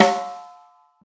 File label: big room acoustic snare sample f key, snare sound tuned to the F note; snare-drum-samples